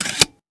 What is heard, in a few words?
weapon foley pickup; Real Guns Sound Pack 2